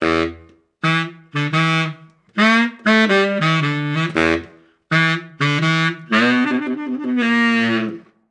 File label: Tuba